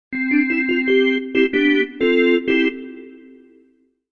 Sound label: E Organ piece